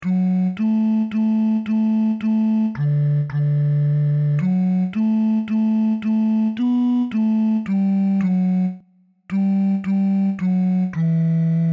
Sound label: Memorial Acclamation A Bass; Renewal